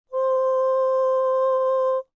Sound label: Falsetto C